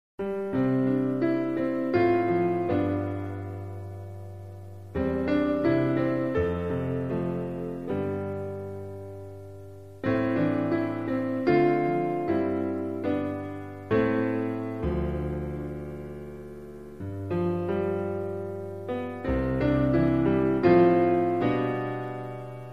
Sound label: lonely piano